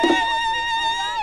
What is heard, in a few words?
AFRO CHRS; Crypton - DrumBase5000; AFRICNRHYTHM